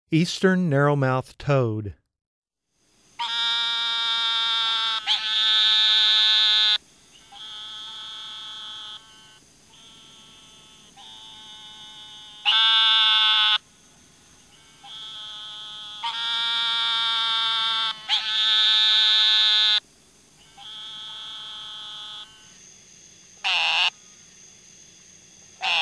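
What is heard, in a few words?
Eastern Narrowmouth Toad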